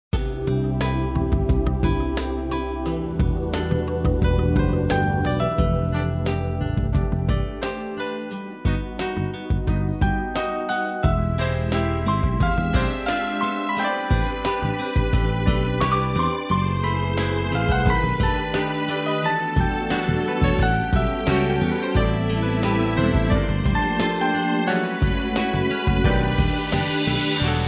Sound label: Easy Listening & Classical; Sound Ideas